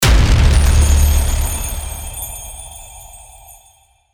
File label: booming wind tinkle